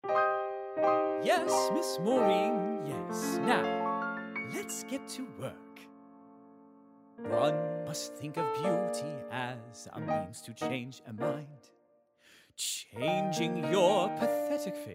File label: Vocal; Callback Piece